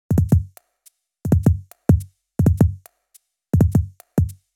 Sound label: 105 Loose Grooves, Percussion; Loops; Percussion (Mono); Touch Loops; ElementsOne; AbstractRhythms